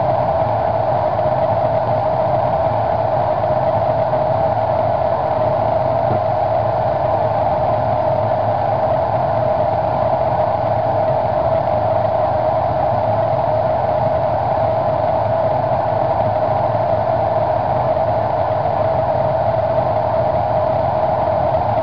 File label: Steel 538ft 4in dia, Water Leak Detection